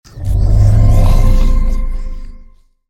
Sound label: Spell Undead